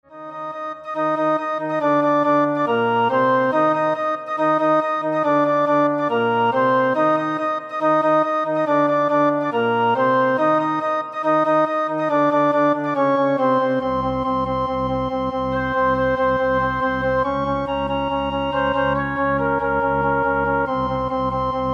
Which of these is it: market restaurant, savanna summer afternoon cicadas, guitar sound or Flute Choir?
Flute Choir